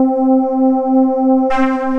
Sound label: Ghost Notes, broken keys; Instruments; Ableton; Factory Packs; Mood Reel